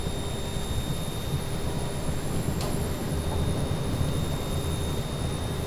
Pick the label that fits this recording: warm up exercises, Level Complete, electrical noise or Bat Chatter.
electrical noise